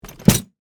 Typewriter Down, Technology, Typewriter, Smith, Corona, Set, Down, On, Table; Typing; BlastWave